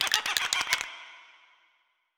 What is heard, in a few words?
shake, halloween